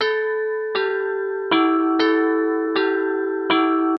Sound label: Ambient Bells, Percussion Loops